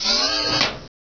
door move; gearbox

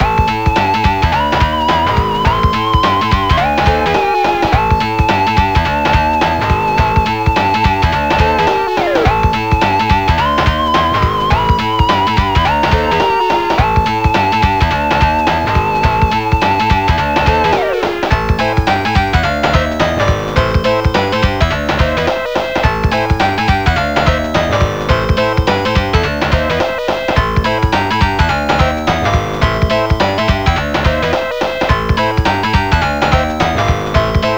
sonic funky; tfc

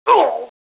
bandit flinch; gearbox

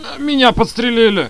alpha wounded; svencoop; paranoia

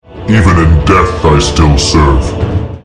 dread

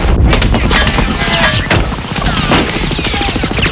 dings; dod

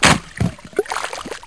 bullchicken attack; gearbox

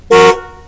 car honk

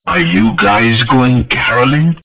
caroling; dmc

